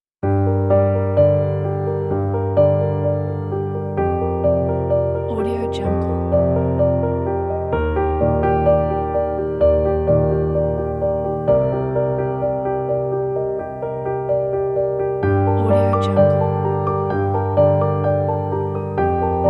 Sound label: evolving dramatic piano and strings